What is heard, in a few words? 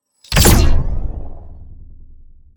Sci fi heavy gun single blast